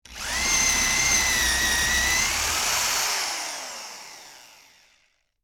Electric Drill, Industry, Electric, Drill, Wood, Bit, Into, Wood